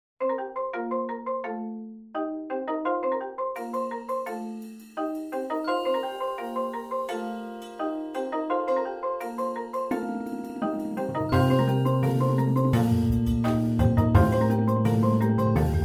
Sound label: Percussion Feature; WVHS